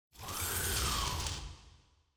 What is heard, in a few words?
designer scifi mechanical servos; SoundsCrate